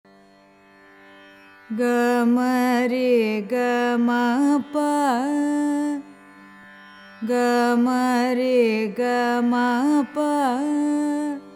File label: shankarabaranam, carnatic music